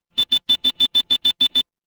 office ringtone

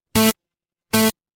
feedback error

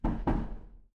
door knock

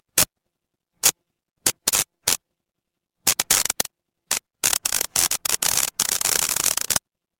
fire electric arc

